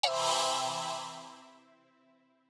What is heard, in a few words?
mac style startup sound